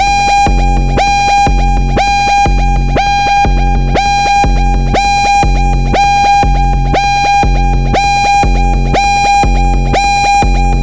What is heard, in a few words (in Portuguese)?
Sirene Futurista